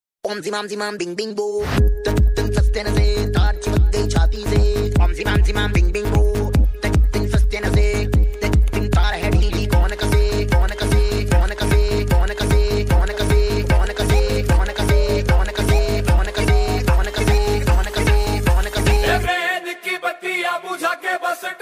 Ringtone Beat Drop Ringtone Funny Ringtone Bing Bing Boo